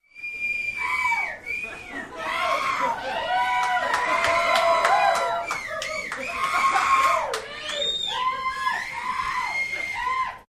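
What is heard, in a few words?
Applause Howling Whistl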